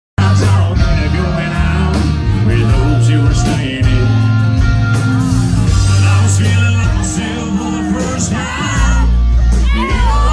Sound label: country thunder